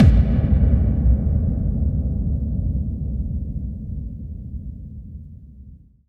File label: BD-FX, Dance Mega Drums; Best Service